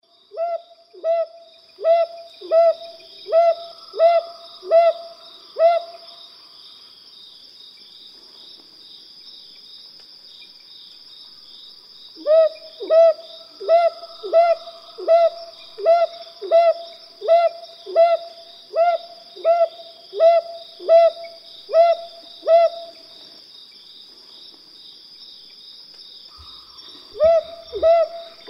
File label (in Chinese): 褐鷹鴞; 鴟鴞科/留鳥